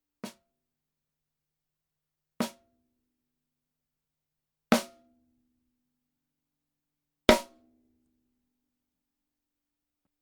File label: TAMA LST1365 mid